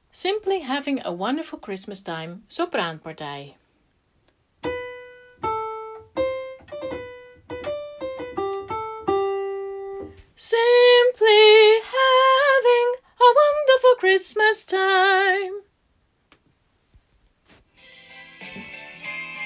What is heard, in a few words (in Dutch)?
sopranen; kerst